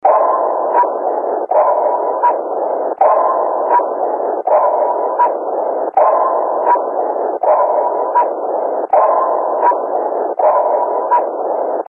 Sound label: Sub Sonar